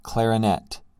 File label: clarinet